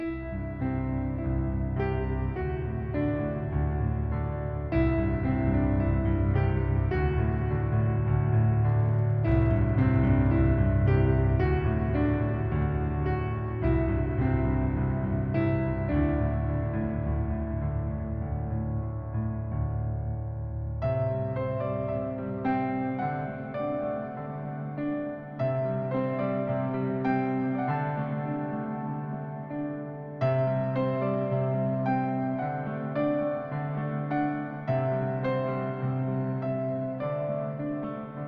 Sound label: Lullaby; Twilight Lullaby; World's Dawn